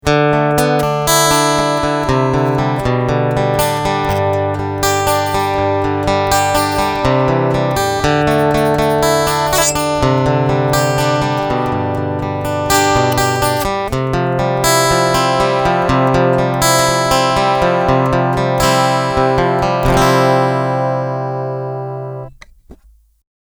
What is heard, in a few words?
Picking EQ Full